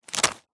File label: food potato chips packet 100g set down lightly; zapsplat